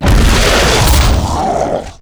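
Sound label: spawn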